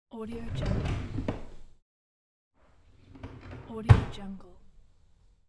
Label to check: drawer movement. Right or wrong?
Right